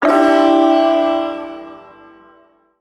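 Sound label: Shawzin Major Chord; Maj Chord E; Warframe; Duviri Ersatz Shawzin; Chords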